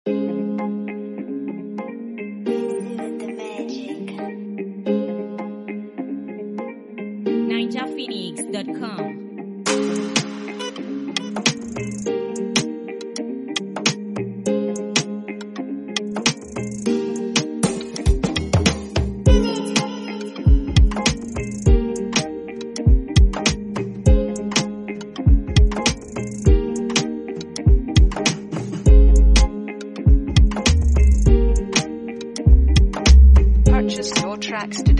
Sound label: Afrobeat Instrumental